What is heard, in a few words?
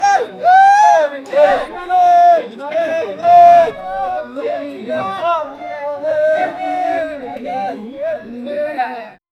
a small crowd of africans